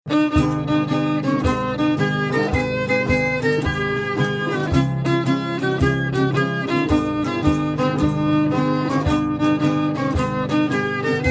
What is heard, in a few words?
Country Fiddle; Battle Hymn of the Republic